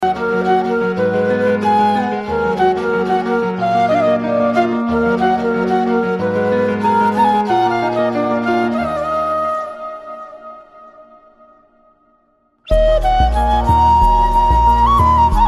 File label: flute bgm